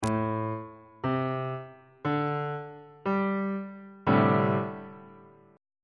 c double sharp dominant 7th suspended 4th 2nd inversion on bass clef